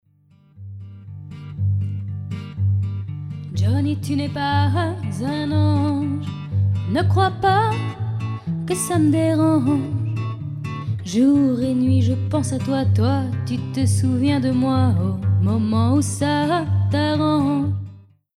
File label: female french sing comercial